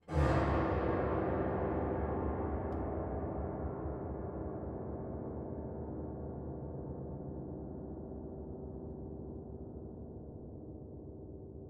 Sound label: cave, ambient; minecraft; SMCNV-pack